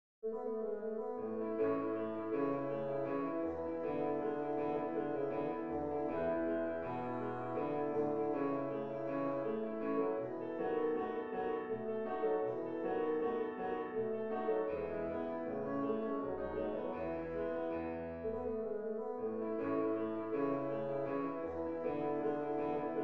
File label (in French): menuet quintette cordes; boccherini luigi